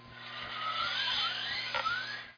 Compound Draw

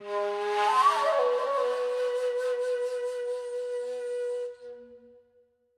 Flute Shakushaki; unruly heroes

